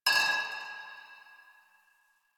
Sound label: Pipe Cement Drop; Warframe; Ambience; Nineteen Ninety Nine